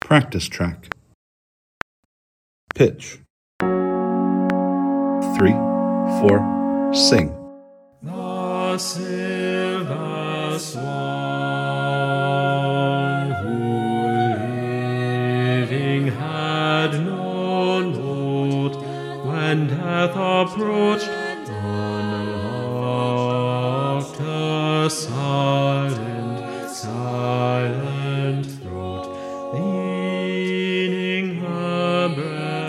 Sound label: quintet silver Swan Bass Practice Track; all-state choir